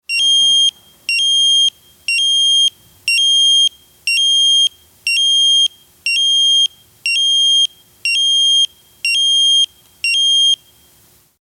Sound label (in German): Pieper